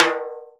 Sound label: snare, timbales; Univers Sons; Basicussions